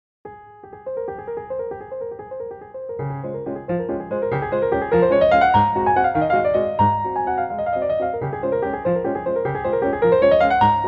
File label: Chopin waltz in D minor